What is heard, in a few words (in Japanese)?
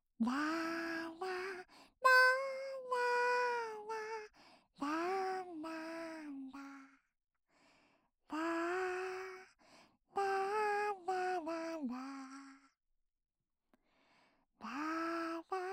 【楽曲】子守唄 リバーブなし